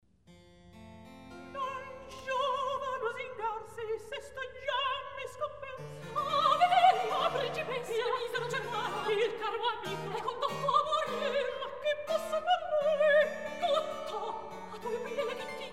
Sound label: Recitativo; Non Giova Lusingarsi; Mozart; La Clemenza di Tito